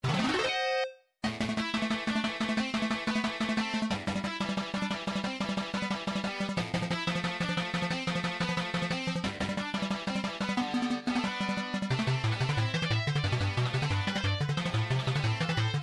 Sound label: enemy detection; nintendo metal gear